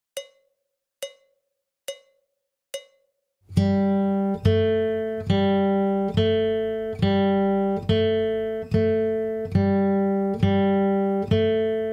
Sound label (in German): WarmUp A mittel, Gitarre